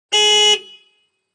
Montero Horn Beep, 1998 mitsubishi montero ext.

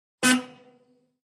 33 Pontiac Horn Blow; 1933 pontiac

